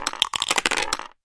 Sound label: dice click; Racetrack Riches; megaboard